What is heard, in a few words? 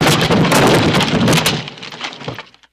Impact Car On Wood Ga, car crashes